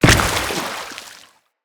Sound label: footstep in water, enemies; Footsteps; Grineer